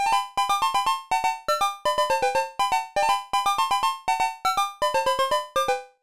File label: Jingle, CLASSIC FROG DOCK